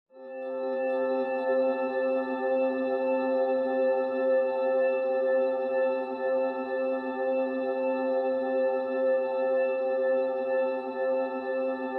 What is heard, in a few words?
ambient